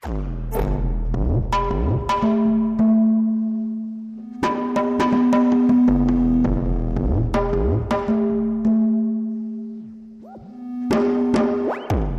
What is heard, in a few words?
Mild Percussion Musical Pulsers